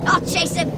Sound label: grunt; h3dialogue; staten